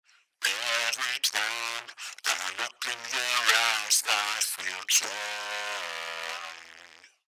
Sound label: Vocals Broken Speaker; Richard Devine